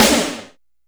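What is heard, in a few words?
snare drum SR16; 90 s Sample CDs; Best Service Dance Mega Drums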